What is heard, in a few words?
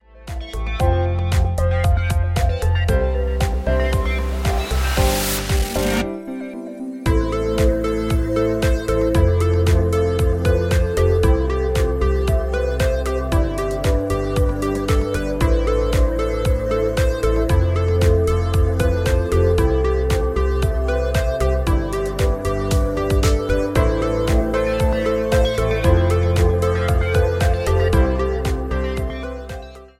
Electronic dynamic movement time; MIUI12